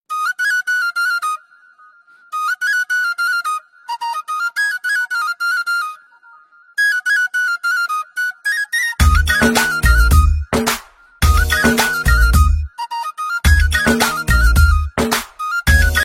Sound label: flute ringtone, bansuri ringtone